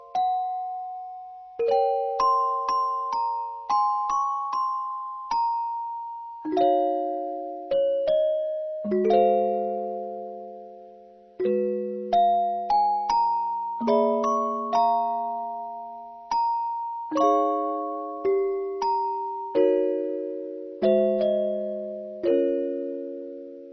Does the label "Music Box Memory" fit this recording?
Yes